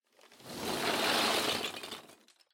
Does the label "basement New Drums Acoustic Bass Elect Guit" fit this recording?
No